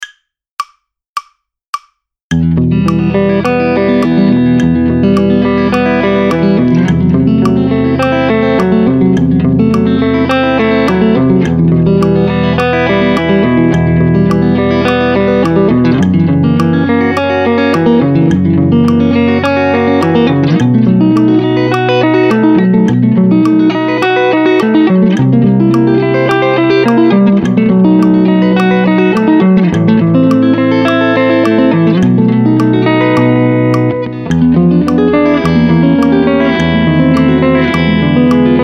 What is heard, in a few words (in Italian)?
Studio stile Villa Lobos; Esercizio 4